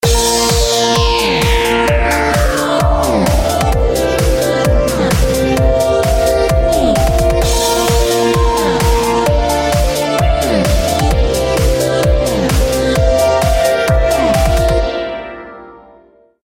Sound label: Upbeat